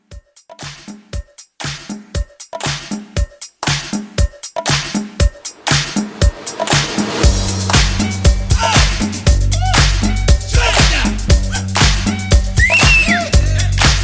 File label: 70s Funk Re Drum 118